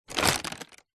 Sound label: foley plastic bag thick pick up; zapsplat